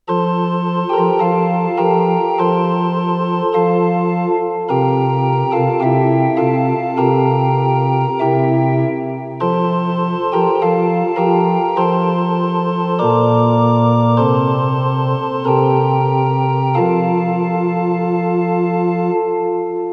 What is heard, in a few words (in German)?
Kyrie F-Dur Orgel; Ukraine